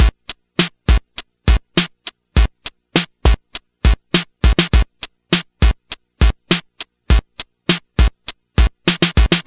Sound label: Game Boy Drum Loop